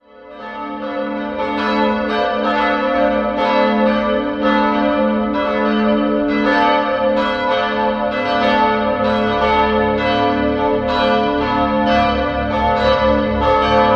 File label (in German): glocken, Ev. Johanneskirche; Regensburg; bei Perner im Jahr 1992 gegossen. Die mittlere von Georg Hofweber entstand im Jahr 1954.